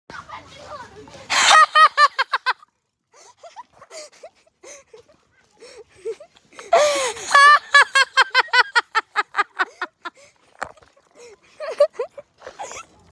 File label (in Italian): risata; bomboclap